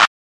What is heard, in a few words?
Clap, Drum One Shots; Cymatics; Eternity Sample Pack